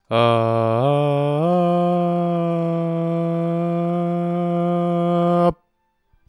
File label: Vocals